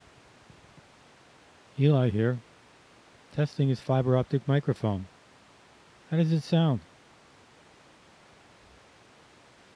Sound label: TEST FIBER OPTIC MIC